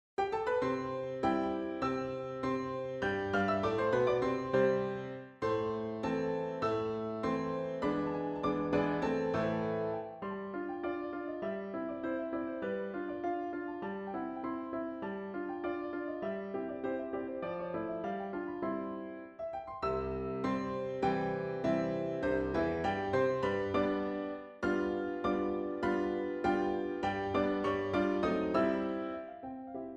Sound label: grand quick step orchestrated solo